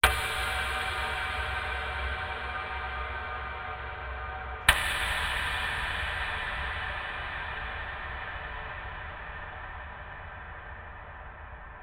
sound design hit large deep dark reverb cinematic weird